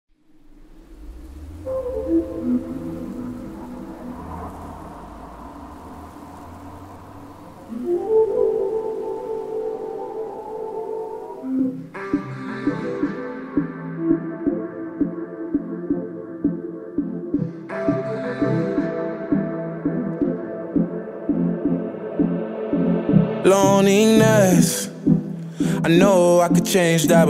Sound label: Ambience